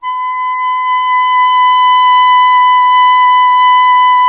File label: CL B, Clarinet; Miroslav Vitous; Solo Instruments VOL-1